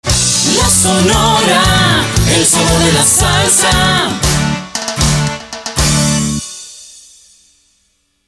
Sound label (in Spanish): Jingle Cantado salsa; la sonora